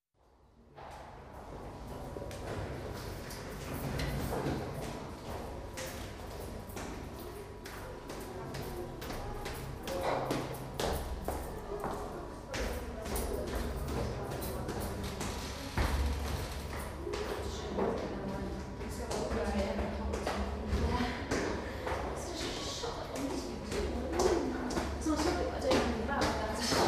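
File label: people on the stairs of a public building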